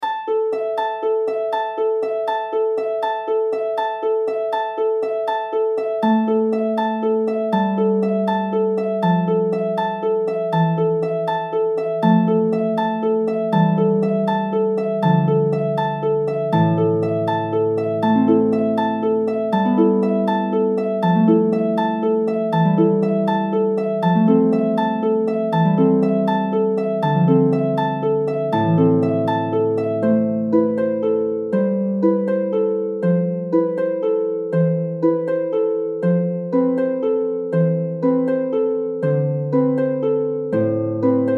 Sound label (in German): Harfe leicht; Carol of the Bells